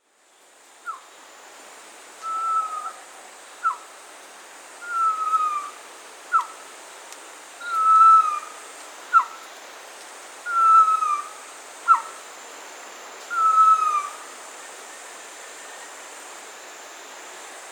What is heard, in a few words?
Pavonine Quetzal (Pharomachrus pavoninus), Peru; S. America